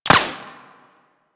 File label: Laser Impact Metal; Warframe; Weapons